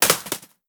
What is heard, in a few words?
Bskt Stn Hit